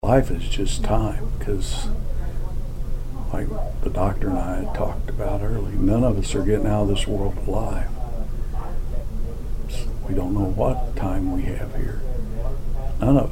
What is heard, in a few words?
organ; “Life is just time,” said Cookson. “Each individual wants a chance at life because they want to make those connections